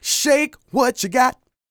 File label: SING, Vocal Planet R&B & Dance; ILIO